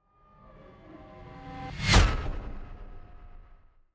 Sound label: fly by hover car hum high